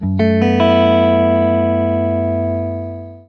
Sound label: Guitar One Shots Fm11; Guitars; Ghosthack; Advent Calendar 2019; Xmass